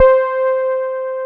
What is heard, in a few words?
Poly800 C4, Korg; Back In Time Records; Korg Universe VOL-1